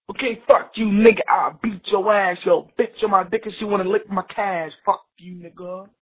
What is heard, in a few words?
rapping